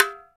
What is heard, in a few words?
Tamborim Rim, Percussion; Roland BK-5